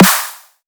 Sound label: snare weak